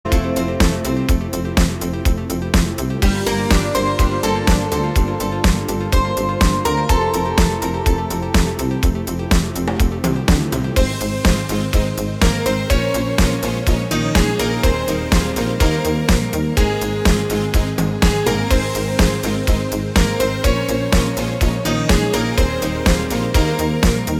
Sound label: dance